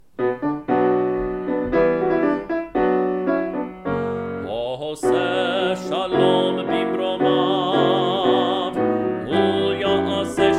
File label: Ose Shalom